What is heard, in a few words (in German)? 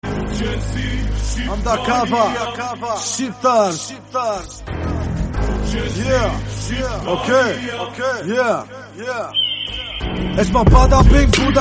islamischer deutscher klingelton